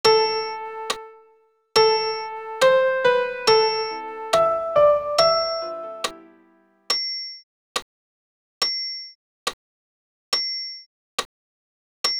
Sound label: Ite missa est sop pian seul